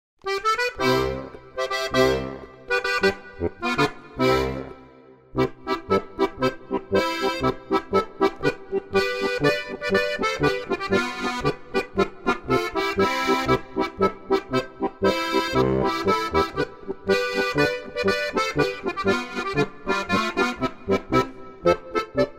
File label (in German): Kreuzpolka Plattler